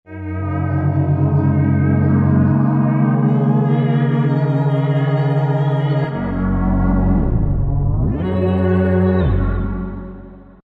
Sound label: Roland V-Synth; Factory Patches; 252 Astronomy